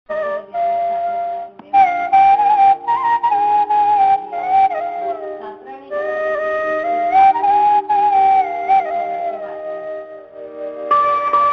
Flute; Tv Serials; Imagine Tv; Ramayan

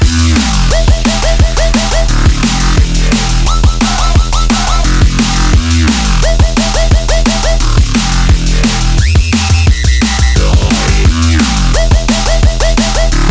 Drum N Bass

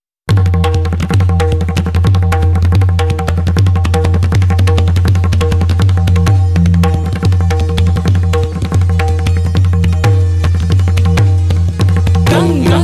Kathak - Indian Classical; American Blend (2006)

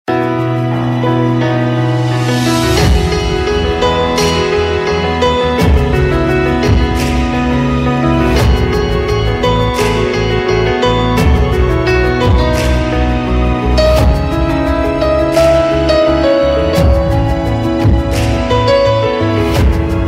Motivational Theme

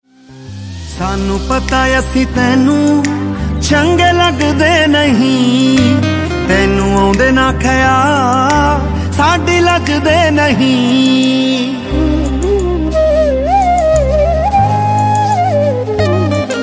Ringtone, Punjabi

